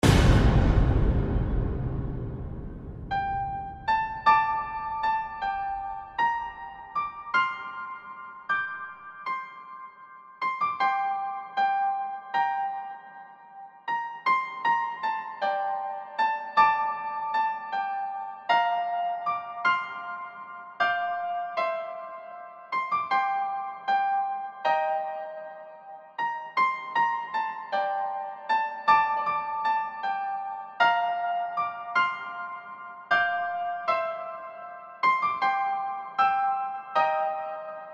game music

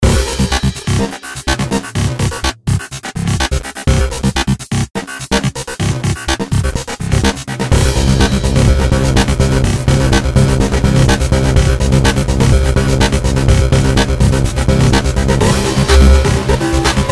robofunk

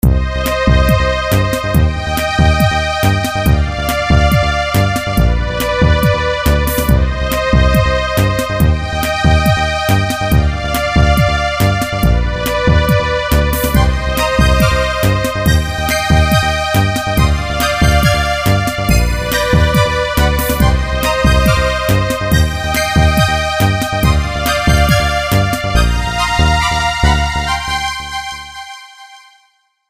site loop

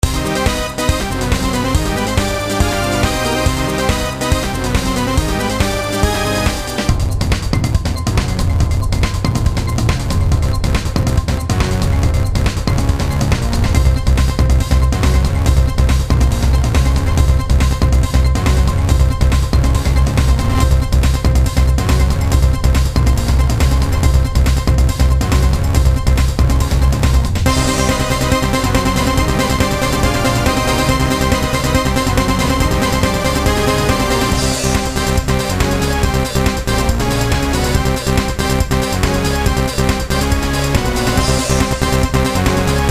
midbattle, music

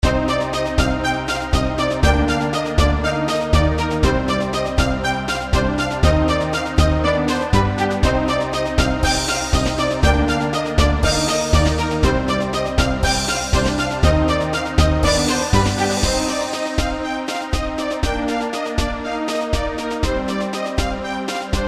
orchestra depressed

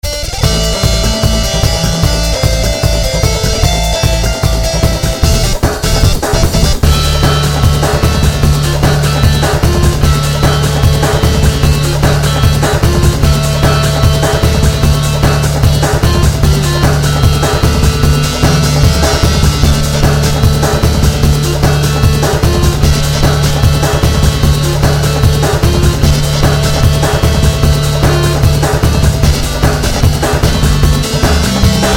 guitar test

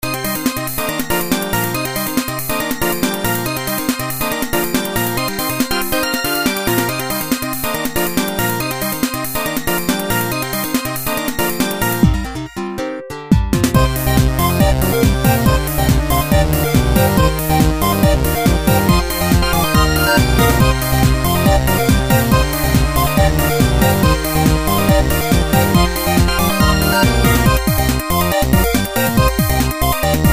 startup, music

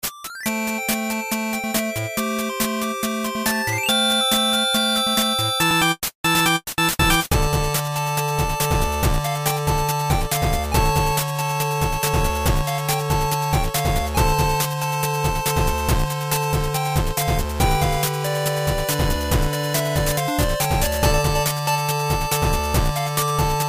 midbattle, music

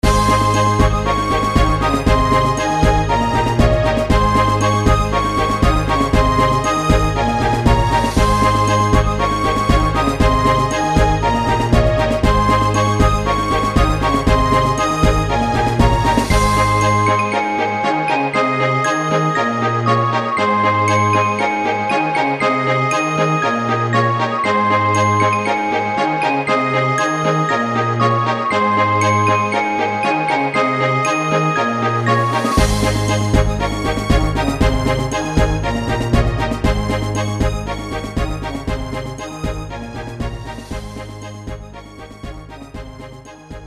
orchestralala

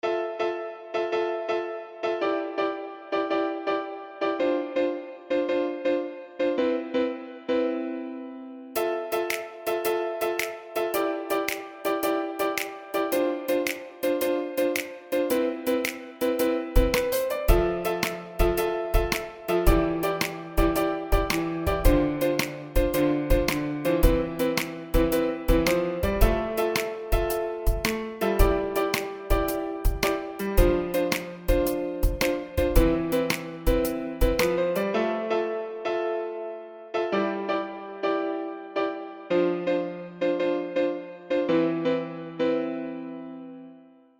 piano beat smooth

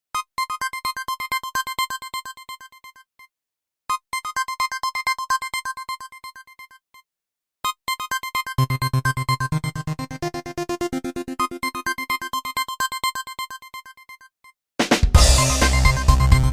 underwatery, music

